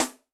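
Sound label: Open Rim A, Yamaha DTXpress-II snare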